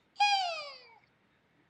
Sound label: happy jump